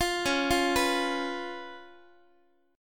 C sharp 7th Guitar; arpeggiated; chord; x,x,8,11,9,10

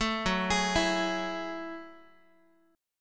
F sharp Minor 9th F sharp, Guitar; arpeggiated; JGuitar

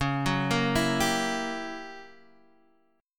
Gb 7th Flat 9th Db, guitar

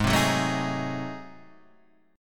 G sharp 7th Flat 9th G sharp, chord; G#7b9 Chord {4 3 4 2 4 4} strummed

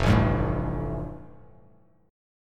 F Major Add 9th, Bass Standard; strummed; 1,0,5,2; JGuitar

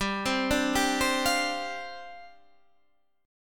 G 7th Suspended 4th G down Guitar Half Step Down

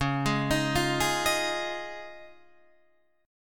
Db Major Add 9th, Guitar, Open D

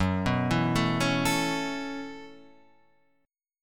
B 7th Suspended 2nd Suspended 4th, chord

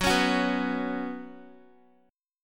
Gb Suspended 4th, Guitar; strummed; x,9,11,11,11,x; 4E,4A,5D,5G,5C,6F; JGuitar

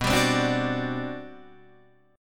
G Major 11th B

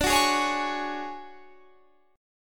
D Major 7th Flat 5th Guitar Standard, chord; strummed; JGuitar

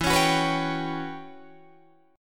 E Minor 6th Add 9th, Guitar, DADGAD; chord shape

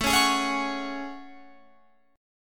A Major 13th, down Guitar Open F; AM13 strummed

